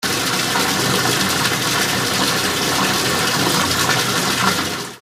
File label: rain; ICAD2003